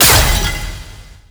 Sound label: Light Orb Hit; Warframe; Eximus; Jade Light